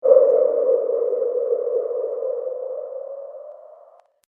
Horror Atmospheric Wind; Scary Soundscape Sound Effects; scary videogames; sinister halloween

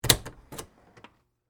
Ice Box Door Open, Antique; Household; Appliances (Sound Effects:Household)

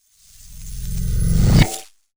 Science Fiction Magnet Attract Super Power; Electrical

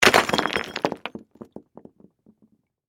Crash, Rock, Debris, Small, Dump; Materials; Rocks

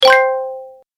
Award - Game Collect; Video Game Sound Effects

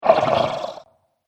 Troll Satisfied Growl; horror; Monster

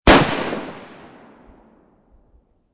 M40 Sniper Rifle Field; M40 SniperRifle Field Fire; VRSonic; Guns

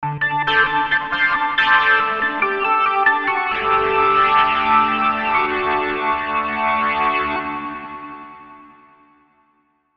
Musical Distortion Organ Reverb Riff; Keyboard; Blastwave; Sound Effects:Musical

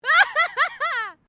FEMALE LAUGH EXCITED; Female vocal sounds; Laughter

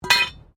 METAL SPIKE FALL; Materials

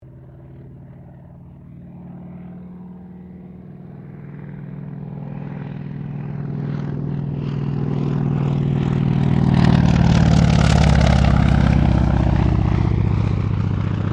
AIRCRAFT CA-28 CERES PASS

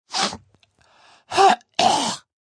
Snort Hack, Sniff, throat clear, (human voice); Sniffling Sound Effects

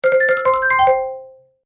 Happy Game Bonus; Video Game Sound Effects

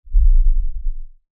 LFE Ultra LFE Hit, Lfe Ultra Low Bass Hit Impact

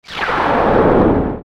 Descend Hiss Warble Fuzz; Descending Sound Effects; Blastwave

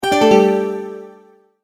Multimedia Tone-Return/Back

